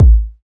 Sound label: studio kickdrum sound a key, Royality free bass drum sample tuned to the A note; kick drum samples